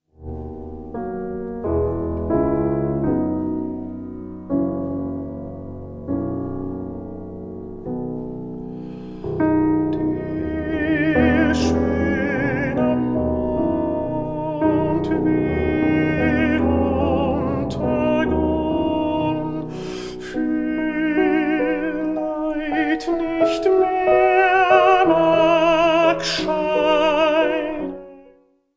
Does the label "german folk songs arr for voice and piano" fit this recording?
Yes